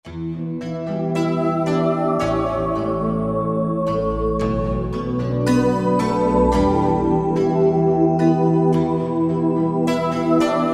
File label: Dulcitar Stk, Roland JV-2080